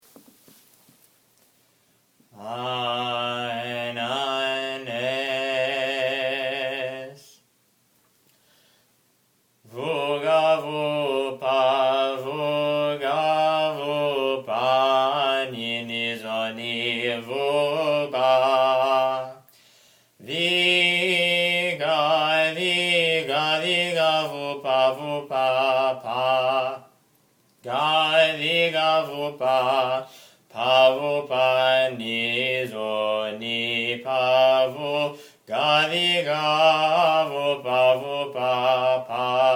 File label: Lord, I have cried - Tone 1 Slow; Tone 1 Parallege; Learn the Tones